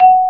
MARIMBA F#4; AKAI S-Series CD-ROM Sound Library VOL-8; SET#1 C PERC

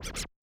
Yamaha DJX 2B, Scratch Pull

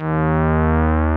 SOFT BASS; Masterbits; Dance Trax

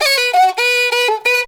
riti grooves; Best Service; World Colours

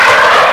THNDR, FAIRLIGHT CMI IIX, WEATHER

